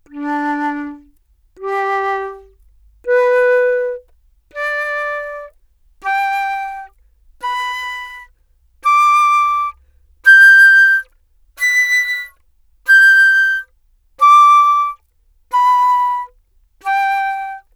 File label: flute arpeggio, Anechoic; OPENAIR; acoustics and psychoacoustics book